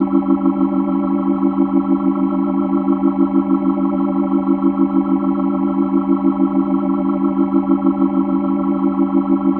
Organ A7, Hip-Hop, Organ Licks

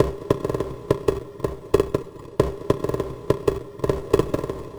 Beat, Concrete Beats; Sound Effect Library

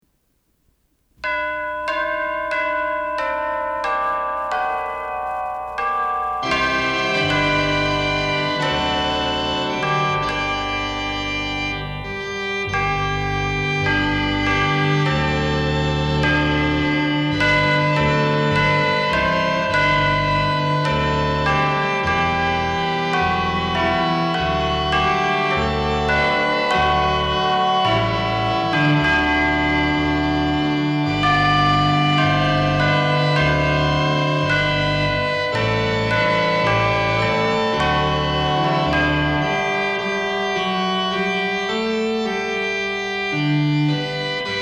Christmas Organ & Chimes

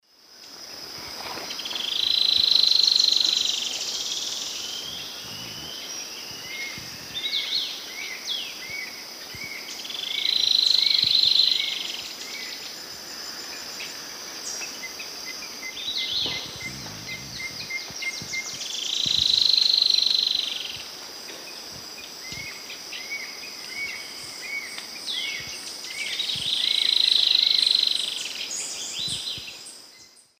Xenops milleri, song; S. America; Eastern Lowlands

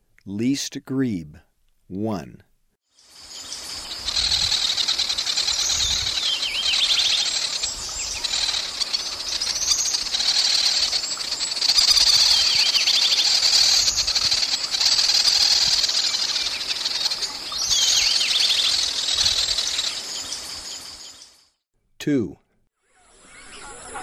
Least Grebe; S. America; Eastern Lowlands

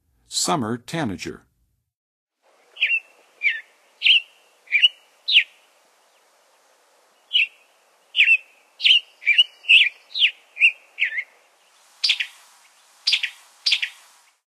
Summer Tanager, Songs of Birds of North America; Western USA; Western USA Songbirds

